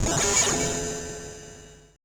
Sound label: AMBI FX